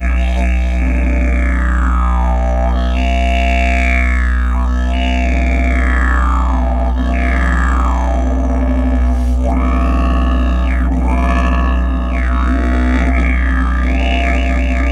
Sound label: B LONG DRONE, Didgeridoo; Big Fish Audio - Didgeridoo and Other Primitive Instruments